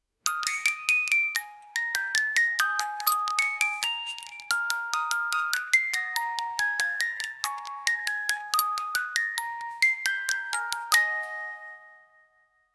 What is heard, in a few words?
retro sound of music box, nostalgia